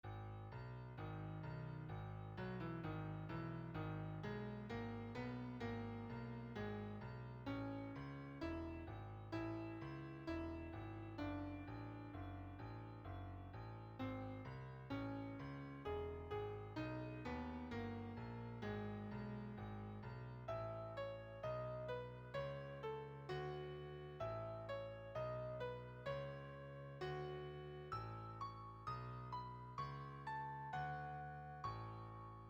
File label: Melody Bass